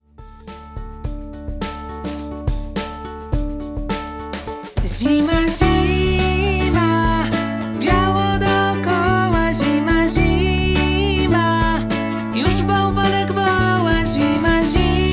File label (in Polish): wersja wokalna